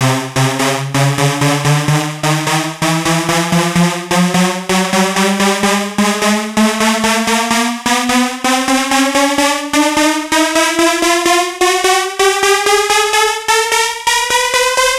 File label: 128BPM Rise Up 8bars; Shockwave - Fx Elements vol 1